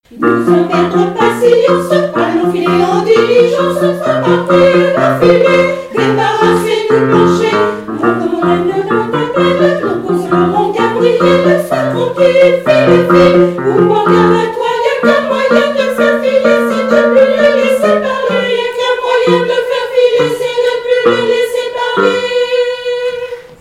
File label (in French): Soprane; Le Sire de Vergy; Nous allons perdre patience